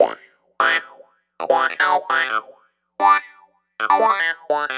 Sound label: Clavinet; Keys